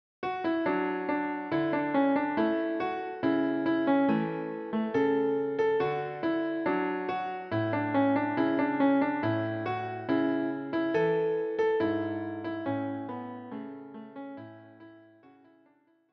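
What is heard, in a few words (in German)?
Der Vogelfänger Klavier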